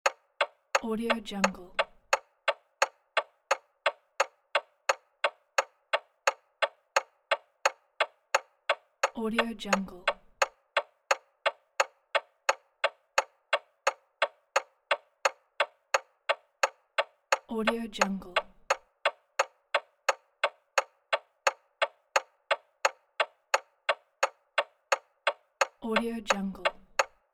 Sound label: clock